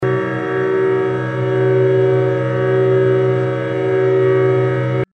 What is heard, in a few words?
microtonal variations of the all-interval-chord; akkord 13 c-2 g-2 e-2 bais-1 cis-1 fis-1